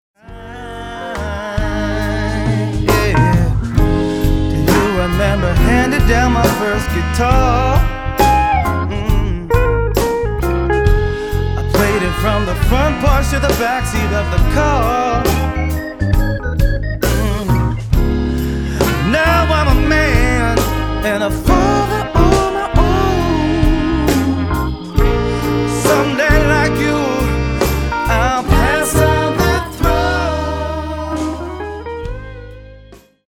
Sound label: Mixing Organ Rhodes